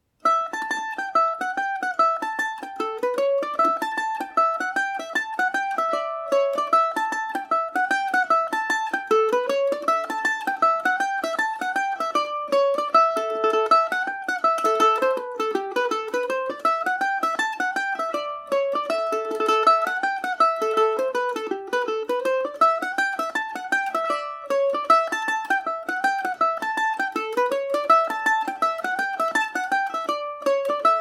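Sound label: A mixolydian paris swing, irish mandolin; jig away the donkey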